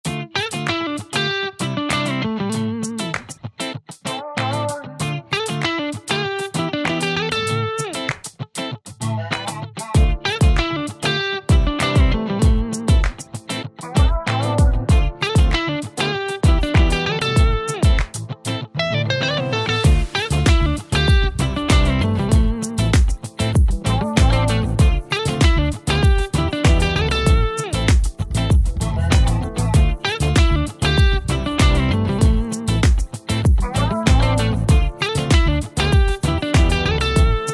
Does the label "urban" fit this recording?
Yes